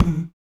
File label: HMM, Human Perc, Body Perc